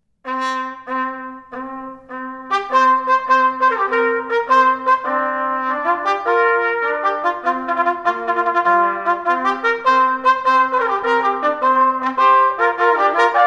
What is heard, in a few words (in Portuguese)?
Pentatonica Quiuchua 4o modo D menor Duetos Trp